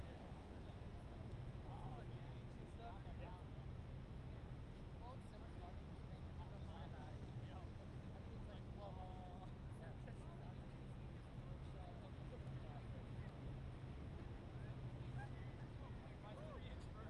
ext day beach medium waves distinct voices frisbee playing helicopter by walking on sand; sonniss gdc